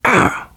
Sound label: hurt minor, survivor voice, biker